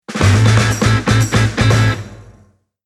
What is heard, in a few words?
jingle